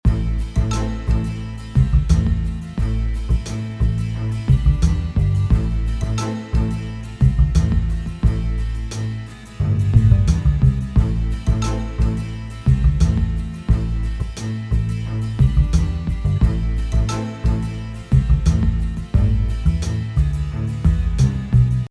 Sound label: Groove; Home Base